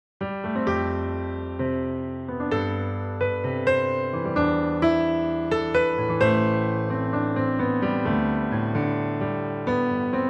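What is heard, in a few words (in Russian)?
фильм успокаивает тёплый и мейнстримовый городской кинематографический и телевизион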